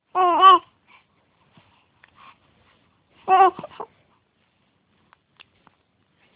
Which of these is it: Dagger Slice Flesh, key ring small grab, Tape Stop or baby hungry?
baby hungry